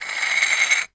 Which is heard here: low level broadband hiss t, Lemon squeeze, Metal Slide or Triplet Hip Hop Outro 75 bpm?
Metal Slide